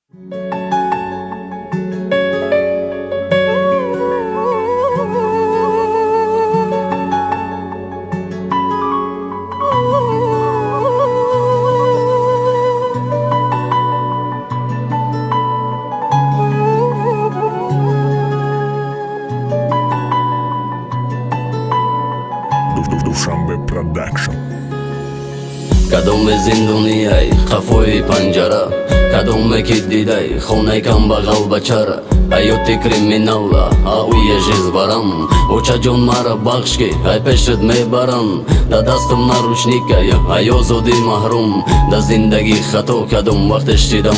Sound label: rap